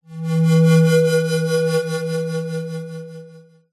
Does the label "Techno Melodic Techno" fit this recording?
No